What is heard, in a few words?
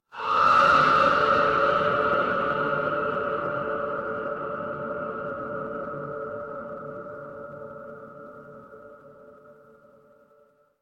horror stinger